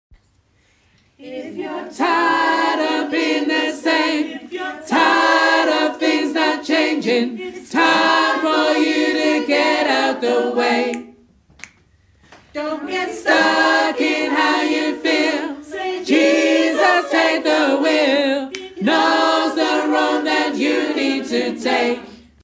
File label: Wanna Be Happy Chorus Tenor Bass